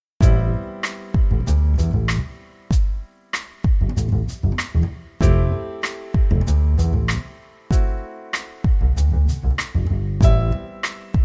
Acoustic Funk Full

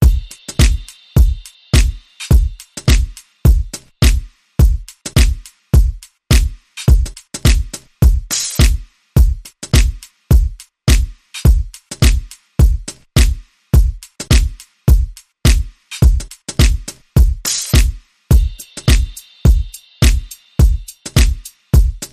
Elevator Music

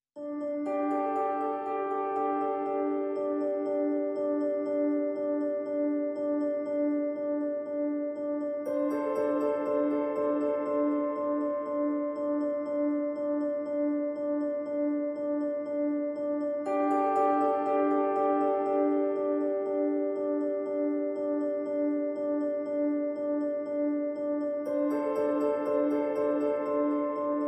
Piano Only

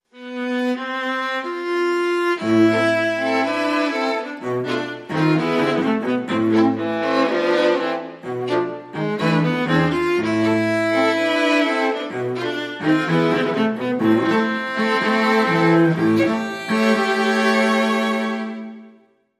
Tango

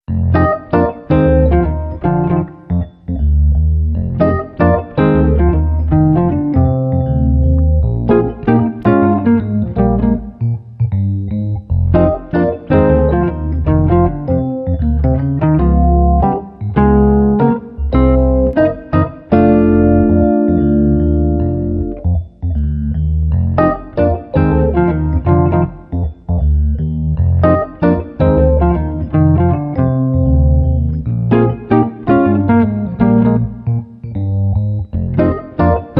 Bouncin Blues

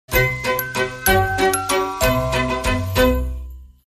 Quartet Silent Night

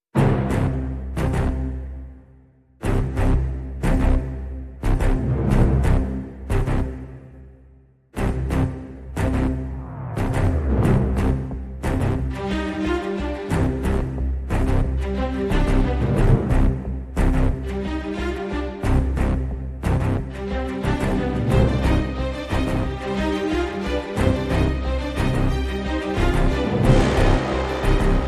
No Perc

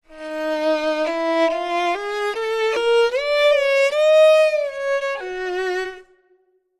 Violin

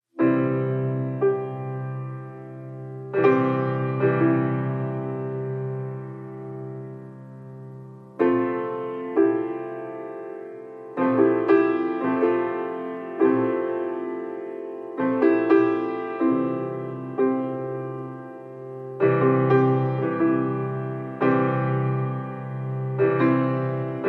No Vocals No Acoustic Guitar Mix

